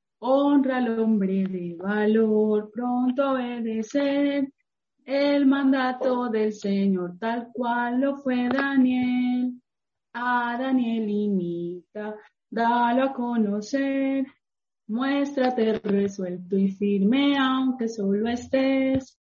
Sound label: God Will Take Care (Spanish)